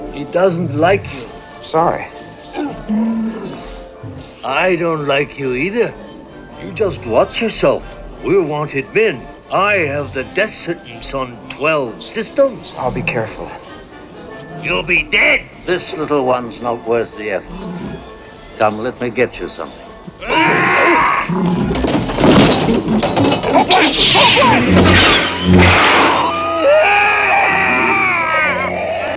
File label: barfight